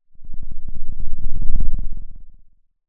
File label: Sound of a lion’s roar